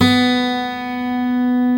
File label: GUI Ac., GUIT AC; Best Service Gigapack 2